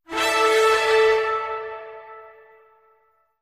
snitch horn; HP

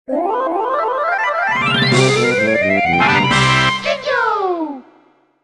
collect all jinjos; Banjo Tooie

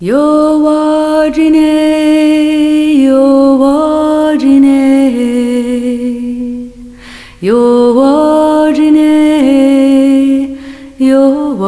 iroquois song; Indian in the Cupboard

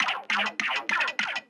wideshot fire loop; Cuphead

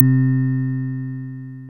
Super Scope Test; SNES Burn-in Test Cart

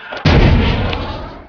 rocket dude attack

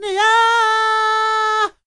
LADY YELL; 4x4 EVO2

